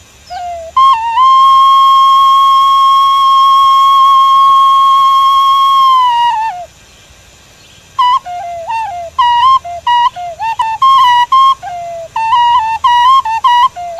Hmong Flute

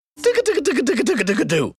Transition Sound

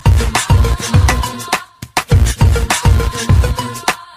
new message beats

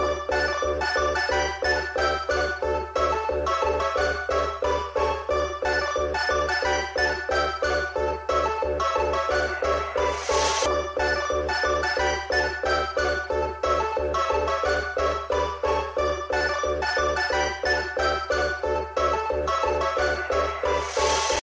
Old Telephone

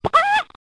new hen singing; animals

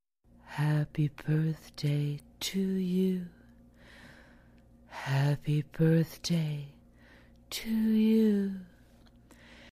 birthday tone; children

